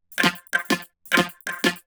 Vocoder Loops 128BPM

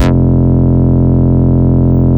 Root F, Bass

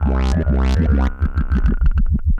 Roland F Line, Roland S100

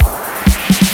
Fill Ins 128BPM; Vengeance Samples

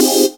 Synth Sound; Vengeance Samples; VEE Synth Shots

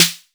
Snares; Vengeance Samples

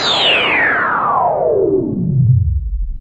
Downsweeps

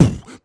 Beatbox Kick, Human Beatbox; Vengeance Samples; Special Sounds

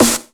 snares; Vengeance Samples

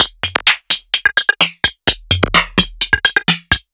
VFX2 Loop 128 BPM

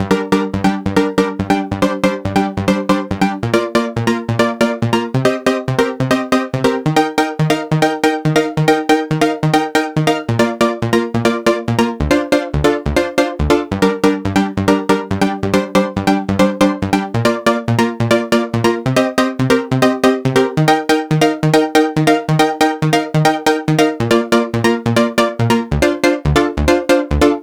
Pluck Main DRY 140BPM, G minor; Melody & Synth; Vengeance Samples; VTS1 Devastating Truth Kit

